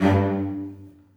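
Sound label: celli staccato f#2; Sonatina Symphonic Orchestra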